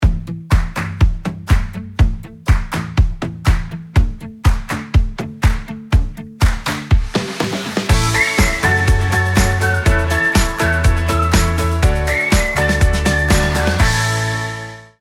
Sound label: music